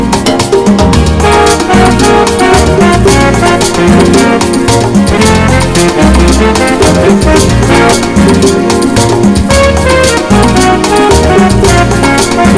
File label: Fast Dancing